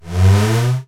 bat up; Geschicklichkeit; Bricks Of Egypt

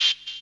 KLIK; Szkola Koziolka

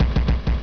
down

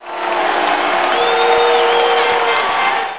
BOOS, CHEERS; Macworld (1998-02); Games World; Football'97 Demo

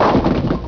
Gutter Ball; Sound Sculptor II 2.2

